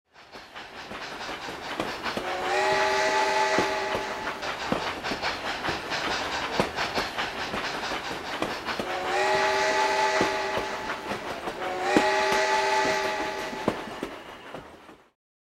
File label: Train Whistle